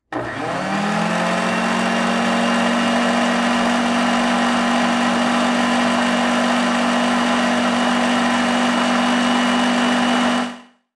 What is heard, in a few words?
Household Blender Turn On Medium Speed